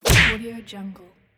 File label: cartoon punch